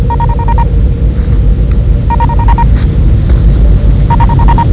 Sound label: Incoming Hail; Star Trek; TNG